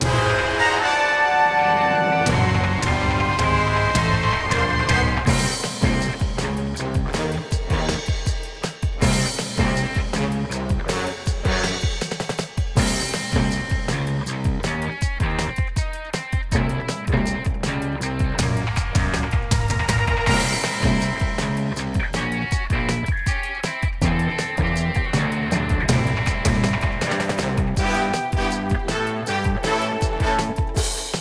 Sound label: Base Battle; bolt 2008 pc gamerip